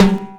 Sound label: Timbale, Hip-Hop, Drum Kit